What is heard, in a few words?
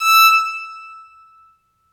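bowed psaltery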